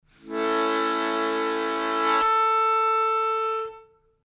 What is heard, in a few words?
Blow Slap Slow, harmonica